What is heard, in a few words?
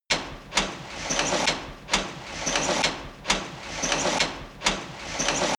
industry machine mechanic dense